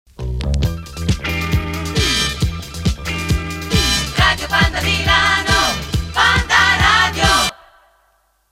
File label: jingle radio; panda